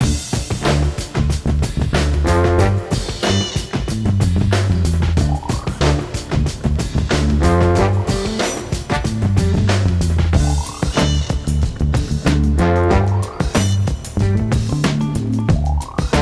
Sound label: Bass; Backyard Hockey